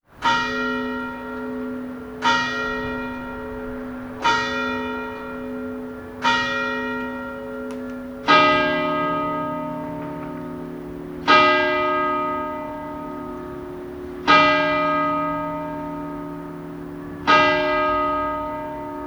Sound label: bells